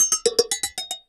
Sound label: LOOPS